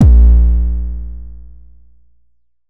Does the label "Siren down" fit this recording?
No